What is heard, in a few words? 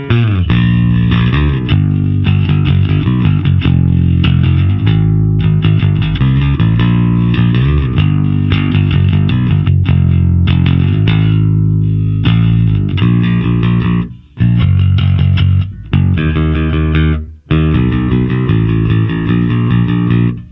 bass